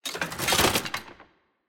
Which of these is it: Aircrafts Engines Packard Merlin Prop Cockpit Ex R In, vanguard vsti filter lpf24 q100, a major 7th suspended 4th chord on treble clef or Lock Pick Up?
Lock Pick Up